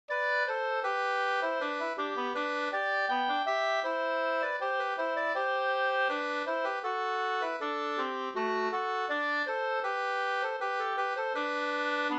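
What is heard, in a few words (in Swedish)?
brudmarsch Burtrasks brudmarsch 2 stämmor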